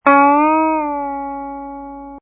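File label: bend and release, guitar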